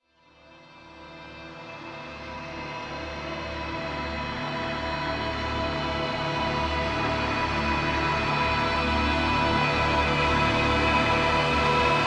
Outro Cinematic Cue, Music; Warframe; DE; Entrati; TennoCon2023

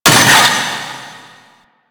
Vaults Stake Gate; Warframe; Entrati

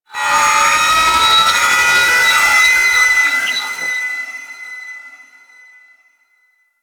Attack Song, Enemies; Warframe; Teralyst; Vomvalyst

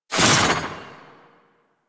Fairy Sword Swoosh; DE; Warframe

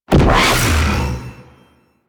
Sentient Ghost Caster Orb Fire; Warframe; DE; Sentients; Sentient Eidolon Ghost Caster; Abilities

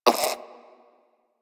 Vocal Tranquilized Grunt; Warframe; Hunting Critters; Cryptilex

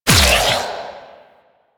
Stack Filled; Warframe; Warframes

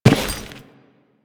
Step Default, Footsteps

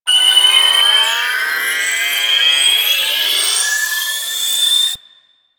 wailing charge; Enemies; Warframe; Vomvalyst; Teralyst; DE

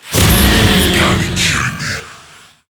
Death; Hard Drive

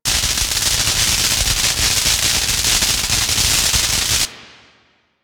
Derelict Battery Loop; Ambience; Props; Warframe; Orokin Derelict

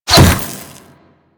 Glass Unbreakable Impact; Warframe

